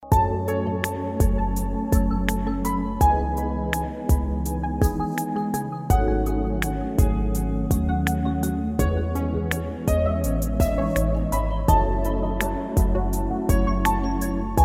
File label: Mobile Ringtone Love Song With Relaxing Moment